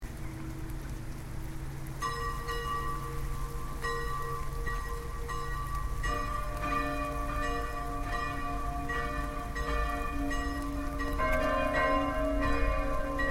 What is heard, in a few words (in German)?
Plenum, Glocke; Waldkirch